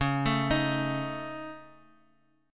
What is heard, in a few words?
C sharp Suspended 2nd Sharp 5th C sharp Guitar Open G, chord; x,6,7,8,x,x